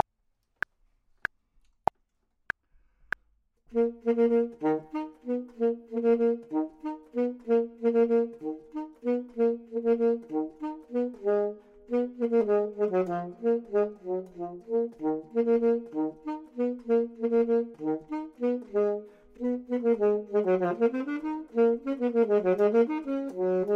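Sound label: bottom, Saxophone and Clarinet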